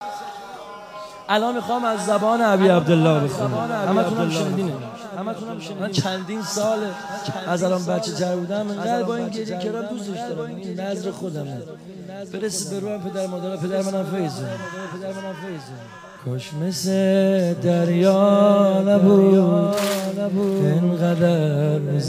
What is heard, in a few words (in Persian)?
مداحی